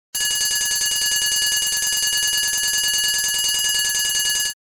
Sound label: Fire alarm bell ringing steady and short